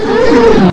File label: SpellCaster; Citadel of Dead; Role Playing and Strategy